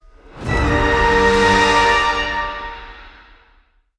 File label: Sting Drama; Aces of the Luftwaffe Squadron